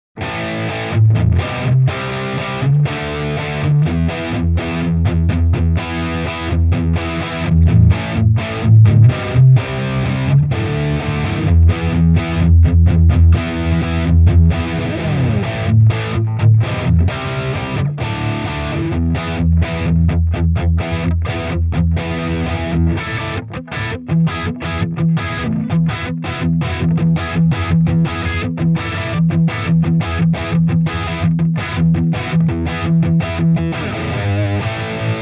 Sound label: Rhythm Amp; Archetype Abasi